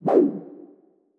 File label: Shoot